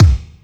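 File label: kick Faazed RM, Alesis D4; drum machine; 300 Drum Machines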